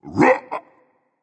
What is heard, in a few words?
grunt jump berserk; cuchulainn